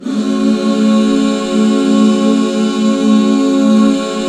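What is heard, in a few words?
F# minor, back chords; Sound & Vision - Gigapack II CD 1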